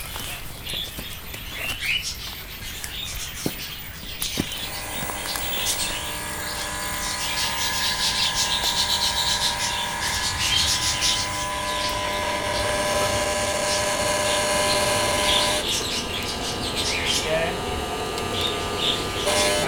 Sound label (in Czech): transformator zabehlice